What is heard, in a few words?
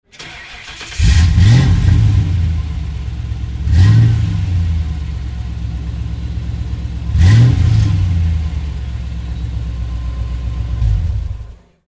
Vehicle